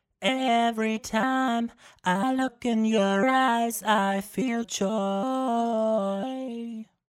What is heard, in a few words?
Vocals Auto Motion Echo